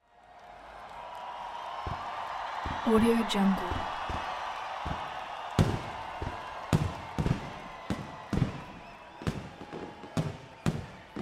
fireworks and cheering crowd; alexander blu

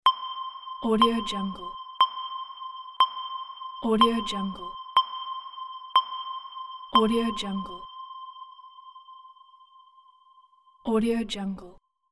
space pings; applehillstudios

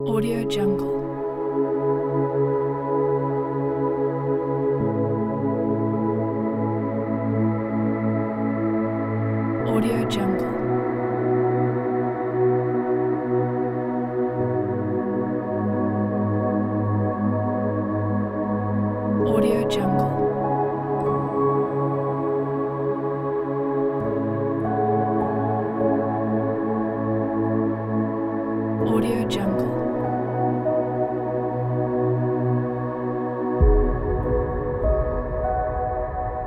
ambient journey

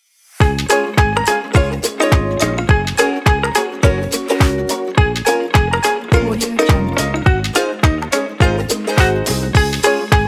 ukulele upbeat happy logo; dailywaves

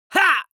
cartoon vocal male fight shout short

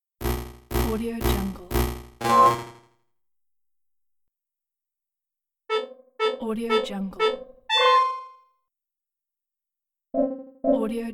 begin level fast counting down

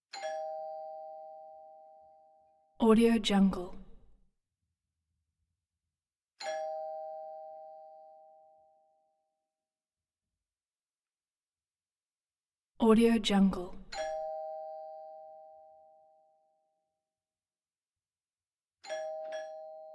doorbell house multiple variations; marks

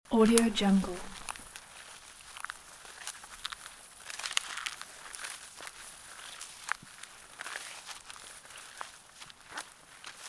walking through dry wheat field

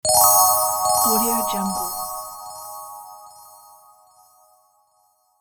magic sound

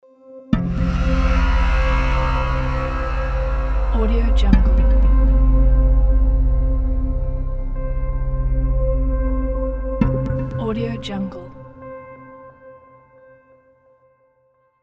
dark atmospheric logo

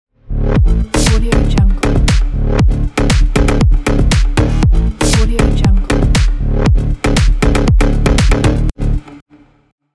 slap house logo

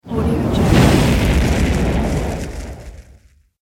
meteor

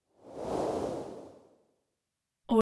cloth whooshes soft whooshing pass bys

